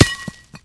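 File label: grass; Mafie 1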